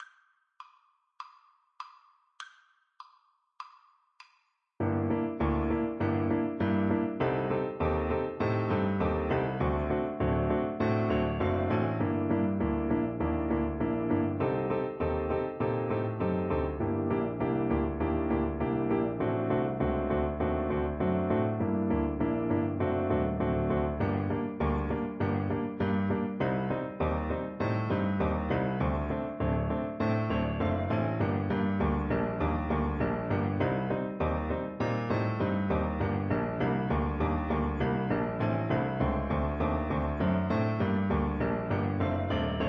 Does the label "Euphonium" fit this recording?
Yes